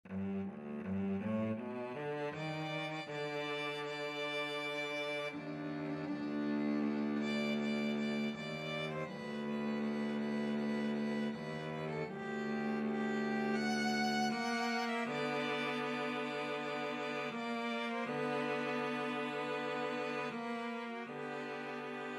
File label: nessun dorma, violin-cello duet; puccini